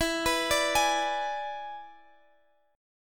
E 7th E down Guitar Open G

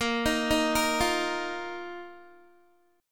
A sharp Suspended 4th Guitar; A#sus4 arpeggiated

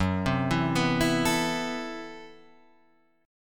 A Minor 6th Add 9th Gb, Chord

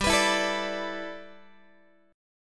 G 6th Add 9th G, Guitar; G6add9 strummed; x,15,14,14,13,13

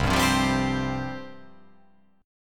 C Suspended 4th C

